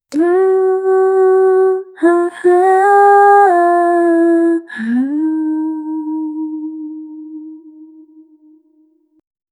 a traditional yoruba lame, african

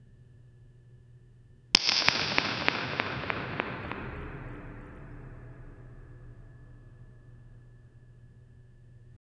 whip crack, cathedral; combat sfx

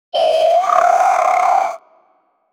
wolf bite and snarl sound

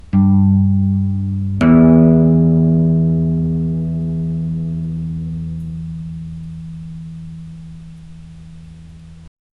acoustic guitar string

